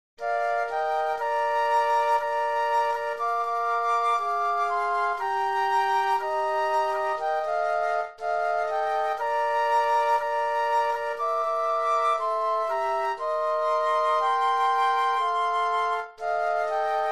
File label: Flute Trio